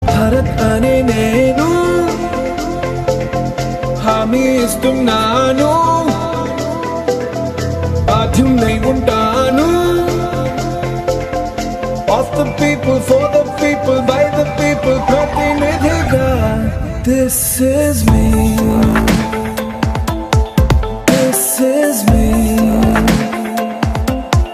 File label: Title Song Ringtone